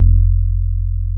BASS 1; Hip Hop; E-MU Formula 4000 Series Vol. 1; Hip Hop Nation; Big Bottoms

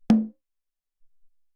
Doumb

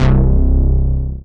BAS, Dance Bass; Sound & Vision - Gigapack II CD 1

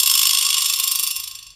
Vibraslap; realdrum

